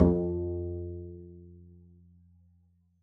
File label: pizz f2, double bass; dsmolken